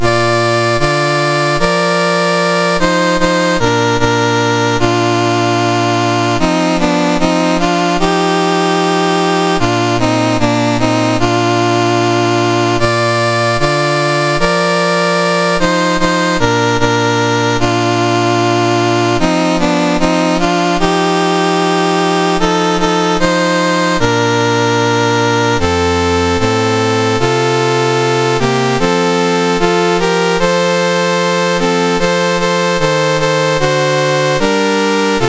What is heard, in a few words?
Croatia National Anthems, MOS6581R2